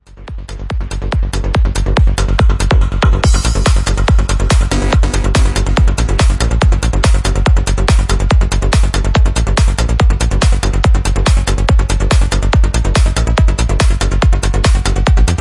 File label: PSY TRANCE; CUBASE